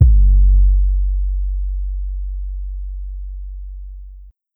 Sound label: Kick Long